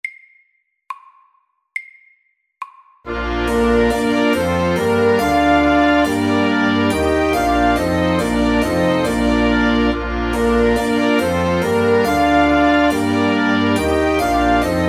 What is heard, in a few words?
Bourree SLOW 70